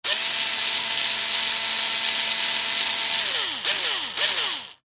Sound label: industrial power tool electric screw driver bursts; zapsplat